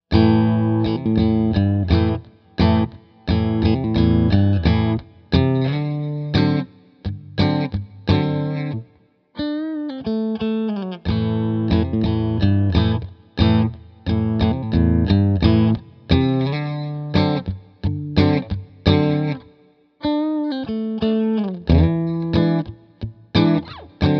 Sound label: Bridge Split Clean, pickups